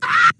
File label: cut off scream